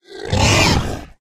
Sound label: flesh aggressive; STALKER; customnpcs